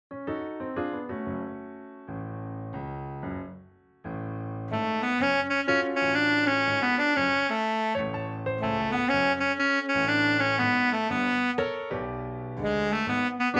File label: song piano with guide; Little Mermaid; Ursula